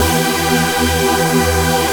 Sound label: SAW